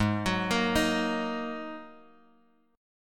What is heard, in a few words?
G sharp 7th Suspended 2nd; G#7sus2 Chord {4 X 4 3 4 X} arpeggiated